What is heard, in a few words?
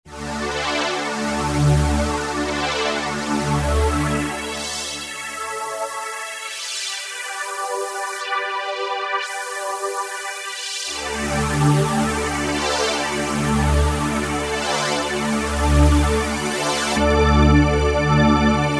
Morpheus Pad, Roland V-Synth; Factory Patches